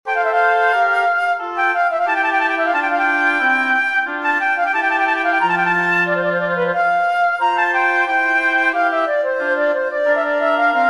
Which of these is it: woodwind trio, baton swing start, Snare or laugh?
woodwind trio